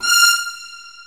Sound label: Ending string bow; jazz jackrabbit 2